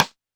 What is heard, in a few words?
stick; Quasimidi Technox